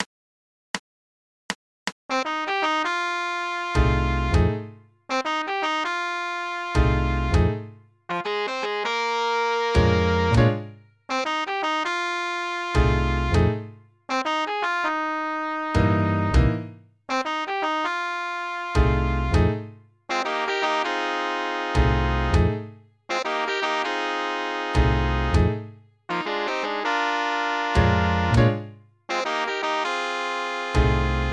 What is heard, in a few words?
Septet, Eb